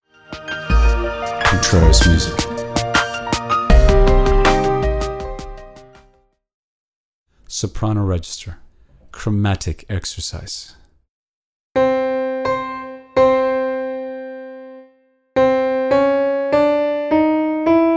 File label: soprano chromatic exercise